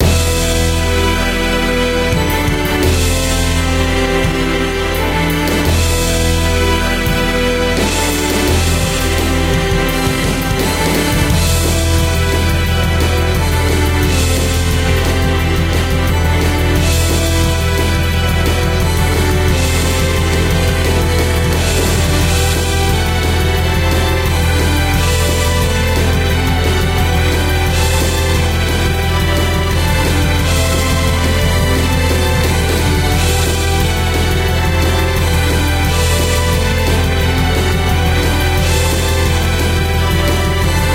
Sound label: Battle; silver nornir rpg android game music